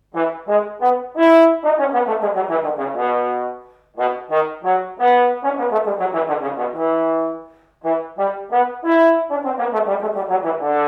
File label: trombone, bass trombone; Rossini Thieving Magpie